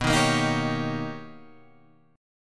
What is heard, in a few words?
B Augmented Major 9th B Guitar Half Step Down, chord